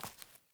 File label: Grunt Boot Walk Veg; Characters; Enemy; Melee Grunt; Footsteps; Boots; Sinecure